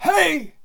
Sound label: Male Shouting Yelling Hey